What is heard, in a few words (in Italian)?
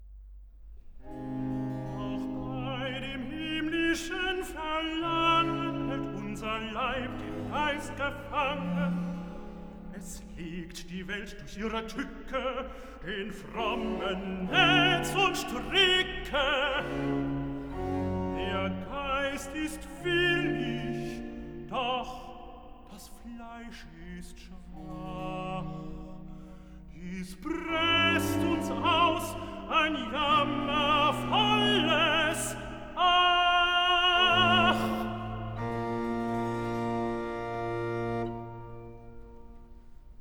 Recitativo (Tenor); Auch bei dem himmlischen Verlangen; Bach; Cantatas; BWV 70; Wachet! betet! betet! wachet!